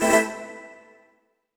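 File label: deep house FX, 125 bpm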